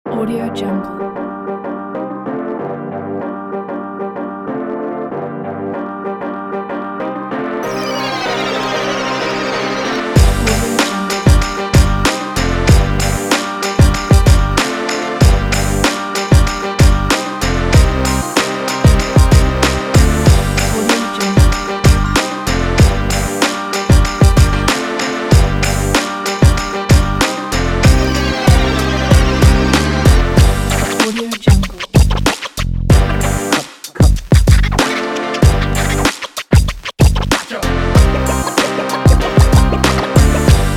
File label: funky hiphop positive beat